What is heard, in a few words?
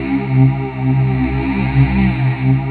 TG SYNC C3; Best Service Dream Experience; TG-ACE